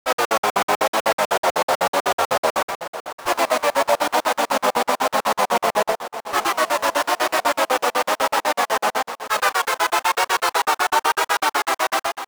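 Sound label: sequence enceladus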